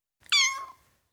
Ten Week Old Kitten, Individual M, Cats; BBC Sound Effects Library

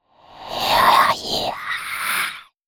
Ghost Whoosh Female; Fox Audio Post-Production

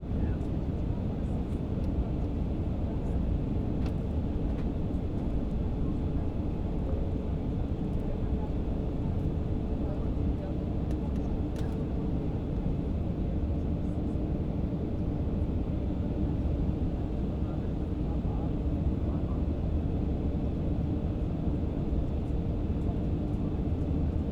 Airliner In Flight Misc Faint Noises; EM172-stereo; Hzandbits; Airports & Planes

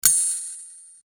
Sword Sound; FXHome; Fighting Sounds

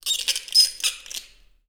Glass Scrape; 99Sounds; The Warehouse

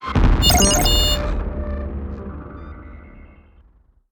synth impact high-pitched; Sci-fi Cinematic; Blik Blinks; SIGNUM; SFX Pack

